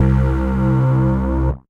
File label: Space Bass; Octo Space Bass; Ableton; Factory Packs; Punch and Tilt